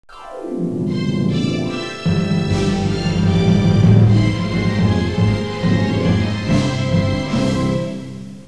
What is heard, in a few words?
8-bit Star Spangled